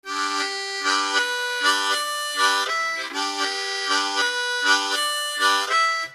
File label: Tongue, harmonica